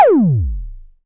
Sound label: MS20 TOM; INIS - Funky Xtreams; MS-20 KIT